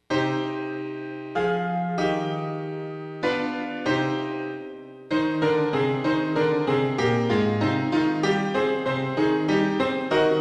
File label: Piano